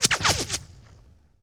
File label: Bullet Whiz By Multiple; Sony Pictures Sound Effects Series; Ricochets - Hits - Whiz Bys